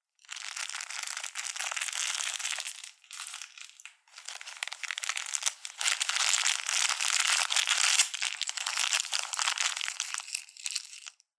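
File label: Cellophane wrapper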